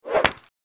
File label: brick unbreak; slots; Kungfu Showdown